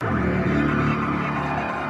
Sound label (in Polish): przeciaganie klocka